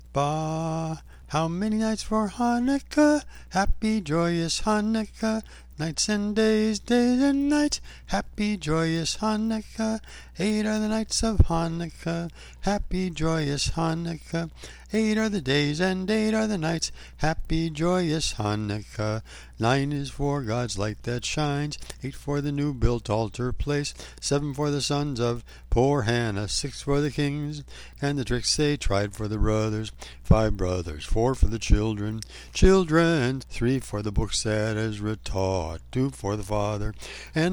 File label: Basses, chorus